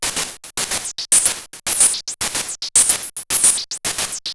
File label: MiniBrute 110BPM, Gated Seq Noise